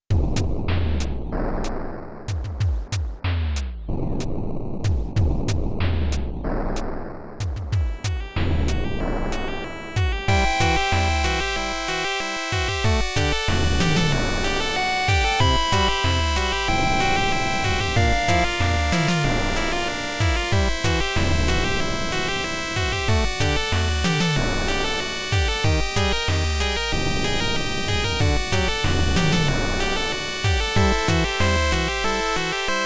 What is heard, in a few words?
Internal Percussion